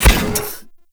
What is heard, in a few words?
Step Default